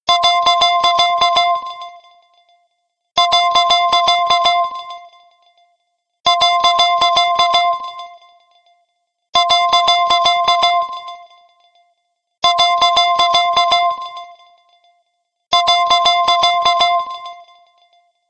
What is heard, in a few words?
Simple Beep, ringtones; LG Revolution VS910